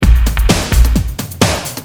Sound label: Hard, Drums Perc; Industrial